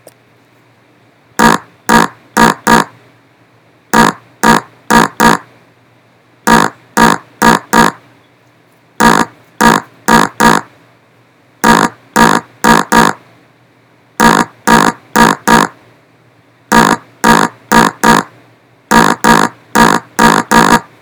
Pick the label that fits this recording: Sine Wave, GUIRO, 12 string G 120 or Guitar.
Sine Wave